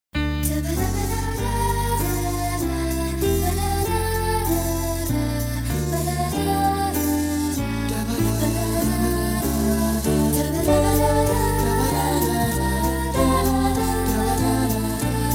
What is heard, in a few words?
Singers